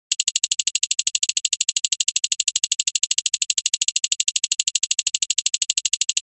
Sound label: simple sound lik, object manipulation